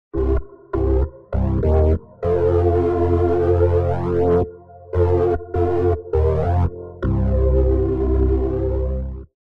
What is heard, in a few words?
Rotary Speaker, Lush Drive, Organ Wet; AmpVibe; Syntheway